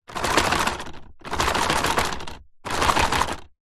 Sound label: plastic hollow large shake rattle; foley